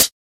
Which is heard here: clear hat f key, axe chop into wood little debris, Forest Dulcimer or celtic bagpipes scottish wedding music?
clear hat f key